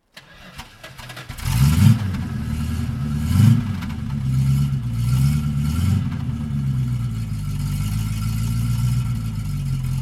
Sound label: Jensen FF